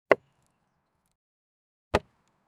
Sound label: archery